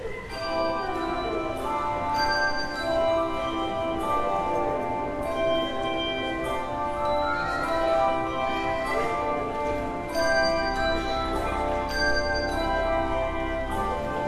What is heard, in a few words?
Handbells